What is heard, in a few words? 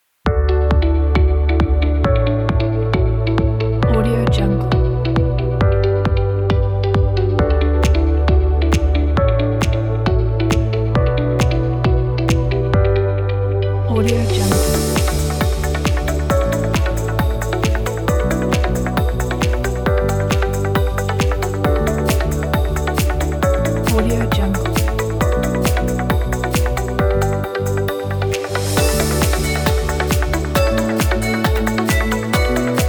corporate upbeat